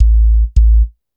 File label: Bass, Futurist Drum & Bass